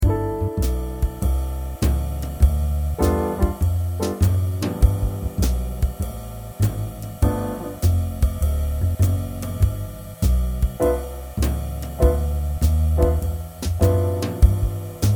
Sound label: backing tracks in g7